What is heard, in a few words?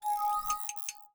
Windows Exclamation